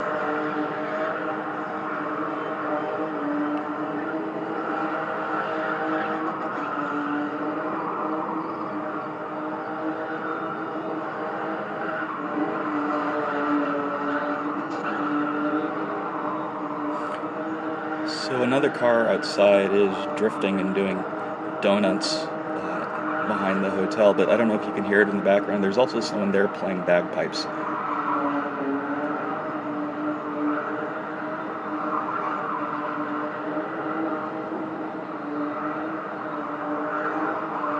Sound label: drifting and bagpipes